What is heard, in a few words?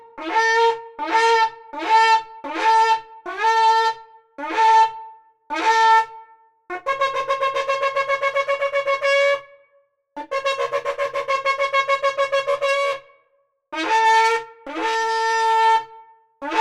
shofar alarm; el shofar tocado en Tsión